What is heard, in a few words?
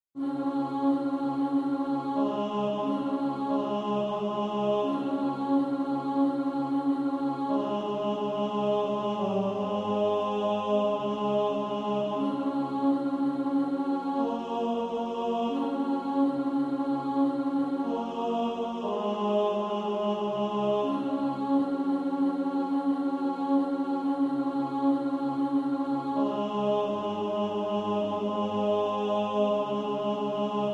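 tenor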